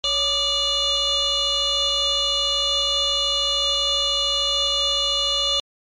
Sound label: Alarm Tone Steady